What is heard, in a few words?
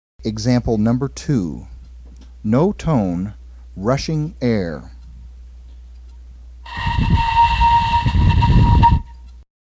Embou FL, Flute; NO TONE, RUSHING AIR; Air is going across, not INTO the hole.; EXAMPLE #2–NO TONE, RUSHING AIR